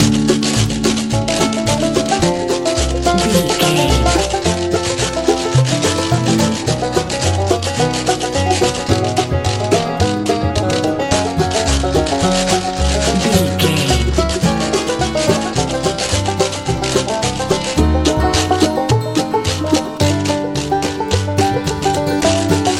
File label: bluegrass